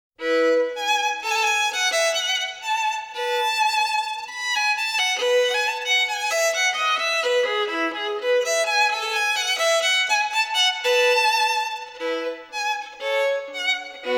Partita III fur Violin solo E-dur, BWV. 1006 3. Gavotte in Rondo; Neon Genesis Evangelion; Evangelion S2 Works